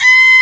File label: HEAGUM G#4, KOREAN; AKAI S6000 CD-ROM - Volume 1